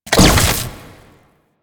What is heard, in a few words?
Fortress Bridge Door Place Explossive